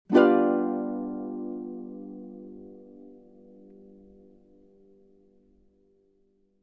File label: Dominant seventh harp chord